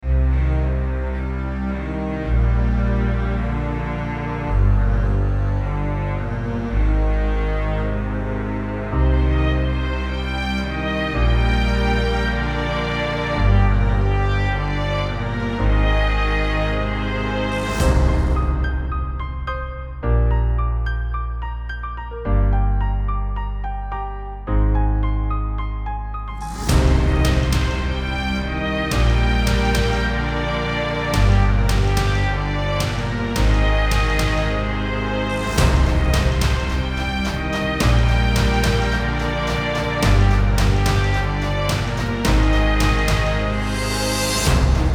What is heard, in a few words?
cinematic dramatic